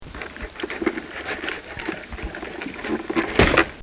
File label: A Horse Eating Grain; Horses; Animals; Bobby Cole